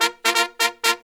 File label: Pop & Funk Brass